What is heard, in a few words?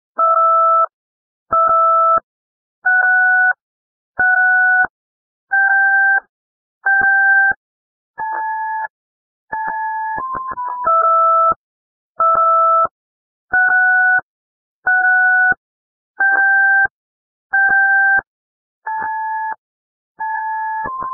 Morse Tone